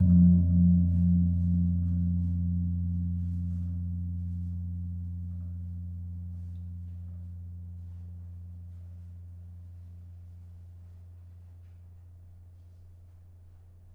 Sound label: Kempul, gamelan